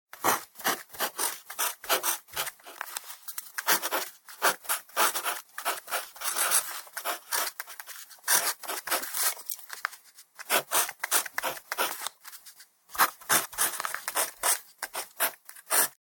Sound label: Mechanical Pen Write